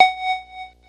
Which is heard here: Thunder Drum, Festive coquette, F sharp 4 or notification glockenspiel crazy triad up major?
F sharp 4